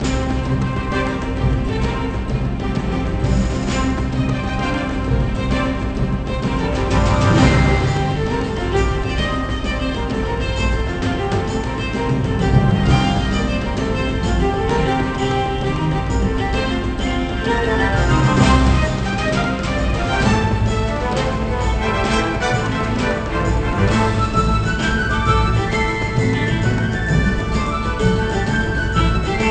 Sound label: BGM Battle Forest; gamerip; How to Train Your Dragon; nds